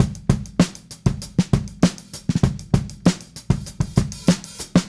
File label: Smooth Groove Drums, 70s Analog Funk; Sonic Foundry (Sony Creative Software); What it Is!